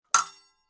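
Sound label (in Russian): удар клинков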